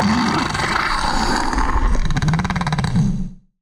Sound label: death; Barotraumatic; Crook